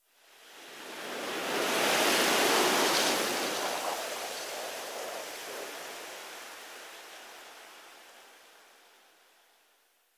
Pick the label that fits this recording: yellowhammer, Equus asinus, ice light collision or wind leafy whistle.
wind leafy whistle